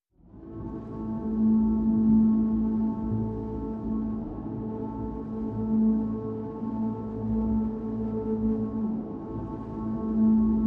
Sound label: Desert Dawn